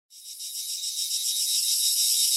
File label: canary crickets